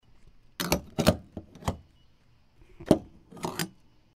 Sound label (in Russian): zvuk pistoleta zaprav